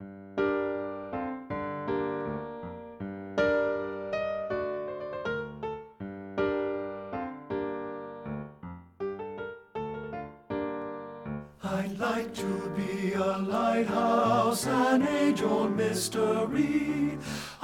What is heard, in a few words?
I'D LIKE TO BE A LIGHTHOUSE TEN